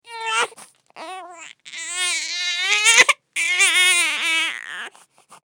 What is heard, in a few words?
Human Baby Cry; Babies; Voice